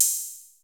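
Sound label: analog open hat; 90 sSampleCDs; Crypton - DrumBase5000; ANALOG KITS2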